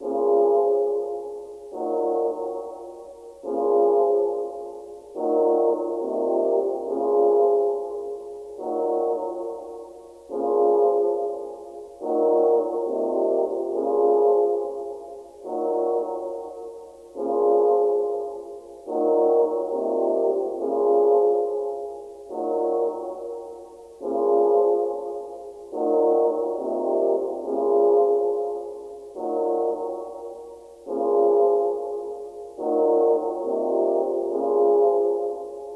140 BPM A# Min Brass, loop stems; Cymatics; 2022 Melody Collection Platinum Expansion - Pt 2; Plug

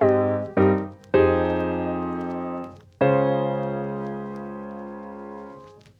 Classic Melody Loop, 80 BPM G# Maj Piano; Melodics; Loops; Loop Stems; Cymatics; Eternity

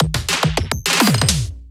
Riddim Drum Fill 140

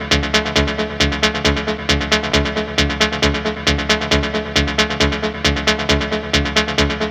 Synth Loop D 135, Techno

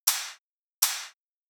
Industrial 160 Perc; Drums; Loops; Drum Construction Kits; Ghosthack; Drum Mega Bundle; Drum Hero 5

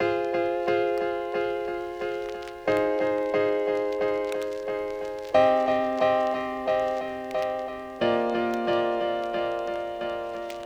Classic Melody Loop - 90 BPM C Min Keys; Melodics; Loops; Loop Stems; Cymatics; Eternity

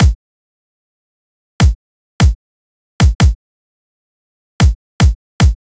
Dubstep Drum Loop Kick, 150bpm; Full Drum Loops (Stem Seperated); Ghosthack; Dubstep Sound Arsenal

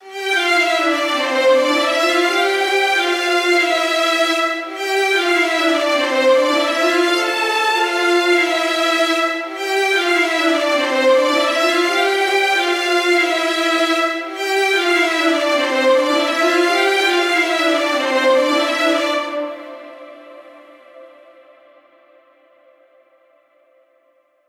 Full Strings Section Melody - Amin 100BPM; Construction Kits; STEMS; Ghosthack; SkyRealm; Kit 10 - Windhelm Forest

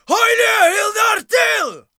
Battle Cry, Viking Vocal, Un-Processed

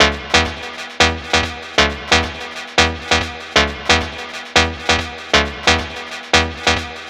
Synth Loop C# 135, Techno; Ghosthack; AC2024 - Day 1 - Techno Sounds 2025

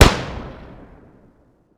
Freeze, Guns

